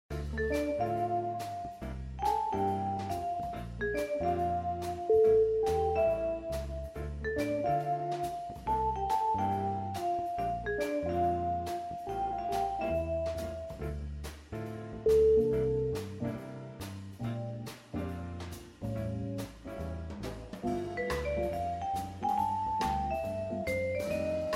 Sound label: Music, Roulette